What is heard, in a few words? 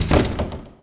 locked